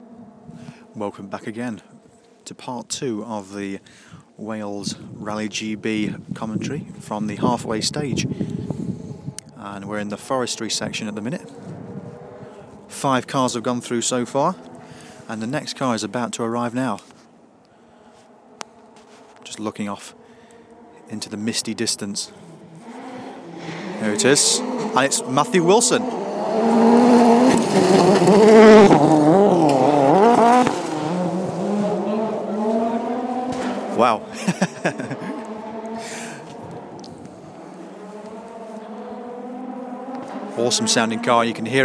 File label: wales rally sounds of the forest; wrc; gb